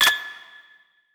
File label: Button Dialogue Confirm; helltaker